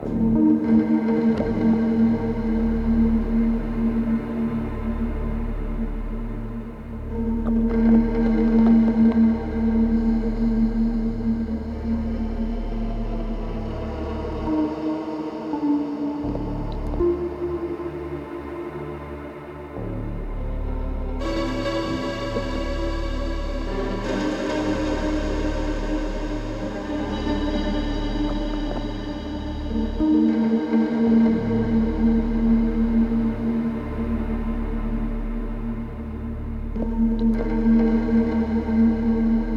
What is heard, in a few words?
loop; Eternity Pack; ZeroVR